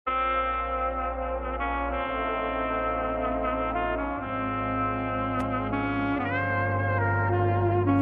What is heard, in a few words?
romantic sax